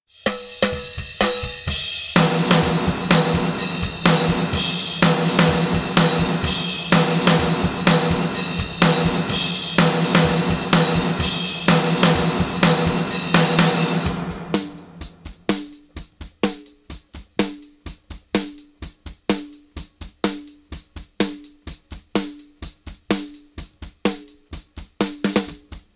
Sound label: drums